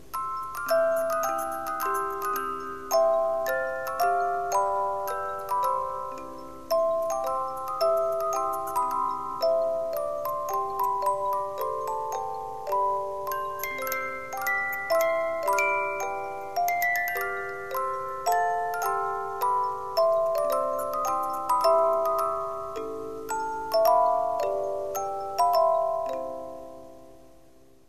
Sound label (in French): Automates Menuet; Beethoven; Menuet pour orchestre - dont seule la version pour piano subsiste